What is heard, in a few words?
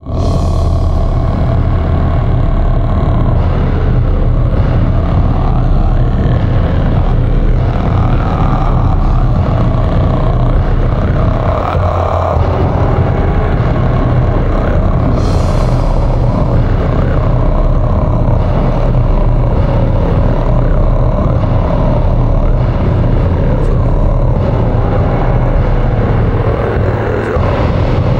Battle Mongolian Voice; Real Warfare 1242